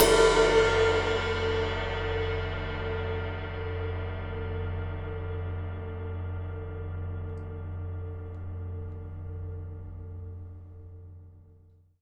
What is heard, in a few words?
crash cymbal drum sound f key